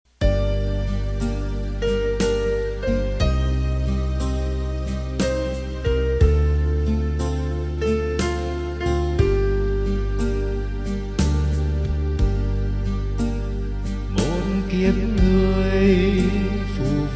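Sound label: soprano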